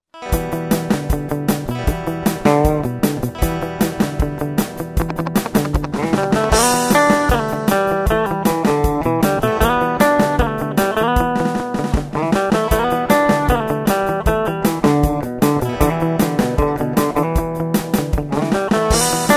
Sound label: surf rock dry